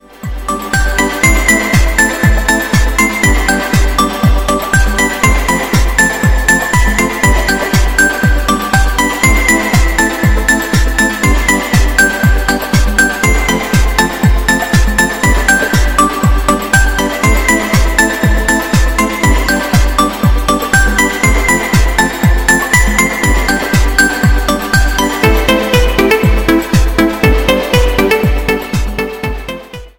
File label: Stable Electronic Rhythm; MIUI12